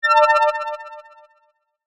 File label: Multimedia Chime, Technology, Multimedia, Chime